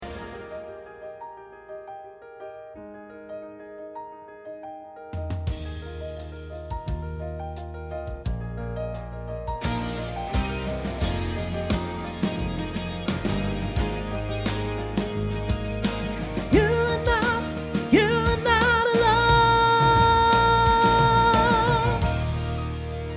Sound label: soprano; soprano ii